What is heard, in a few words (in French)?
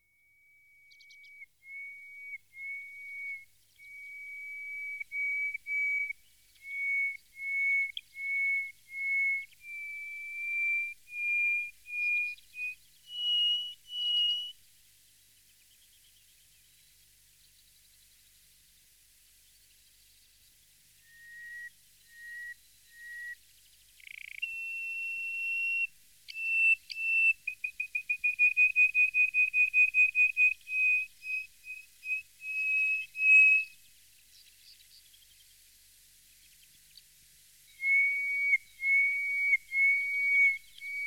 Sirli du desert, chants d'oiseaux; Jean C. Roche